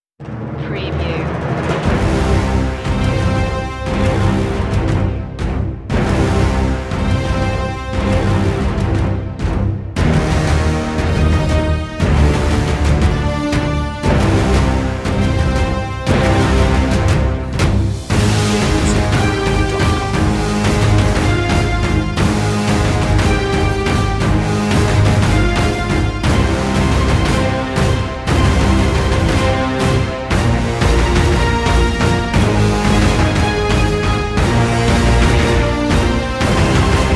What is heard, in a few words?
battle anthem